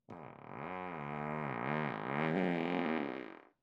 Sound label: Human, Fart; Odor Free Farts Library Vol. 1; Raconteur Sound